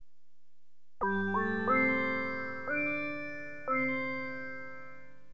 ADITIVA, HipHop Keyboards

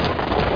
scraping

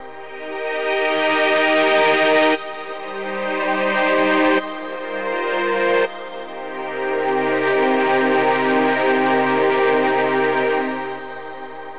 synth pad ambient 160 C; Sphere

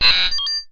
find error

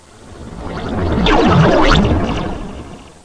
Opening Transition; Let's Discover Texas!

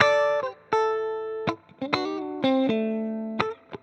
ELEC GUIT ETHNO 125 A, Guitar; eJay

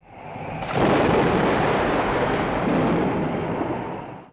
STORM

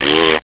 Razz

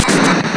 static; Knowledge Adventure

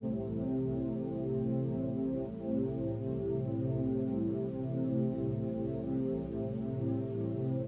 SYNTHPAD ETHNO 125 A; eJay; Sphere

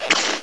snap

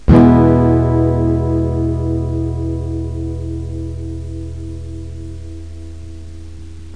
noise; Mr. Wonder's Greatest Toyshop on Earth

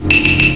Multi Get; Crystal Crazy Demo; Casady & Greene

